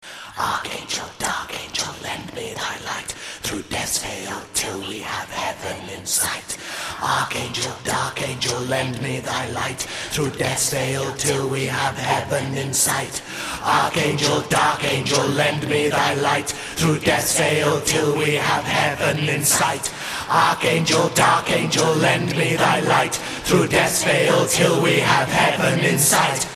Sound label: mantra